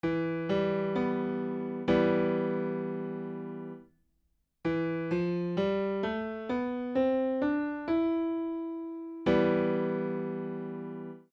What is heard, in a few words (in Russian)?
frigijskij zvuk; Звучание: напряжённое, восточное. Распространён в испанской и балканской музыке.; Пример: ми–фа–соль–ля–си–до–ре–ми.